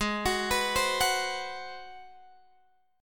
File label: G sharp 7th Sharp 9th, Guitar, Open G